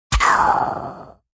Mini Burst, Dry blaster, child vocalization, sci-fi, FX from voice, (human voice); Warped Sound Effects